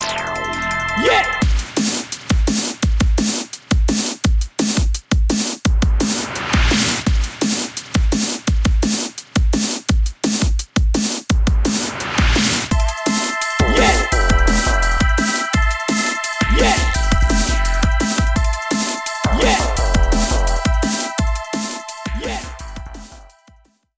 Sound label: Dark Energy Dubstep